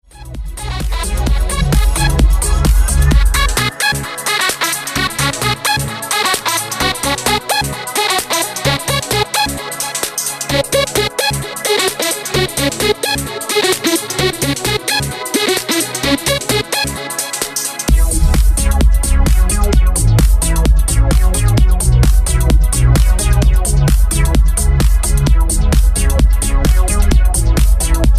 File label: dance music